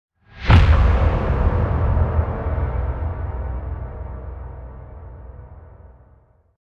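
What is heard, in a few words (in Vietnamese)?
Âm thanh xuất hiện mở đầu của trailer phim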